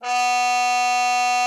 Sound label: CUP MUTE, H3; Xsample VOL-3